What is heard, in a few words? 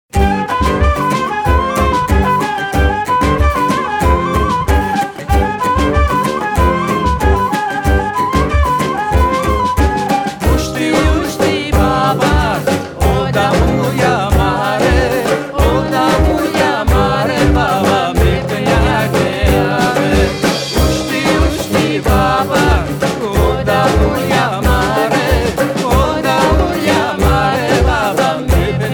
gypsy quintet